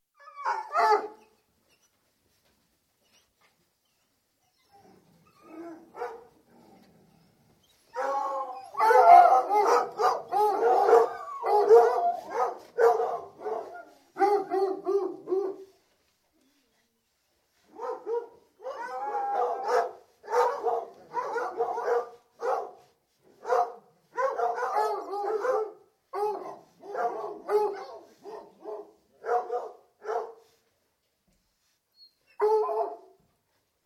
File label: Hunting hounds, approximately 60, whining & barking (Varying perspectives, recorded at kennels); Dog; Dogs Barking; BBC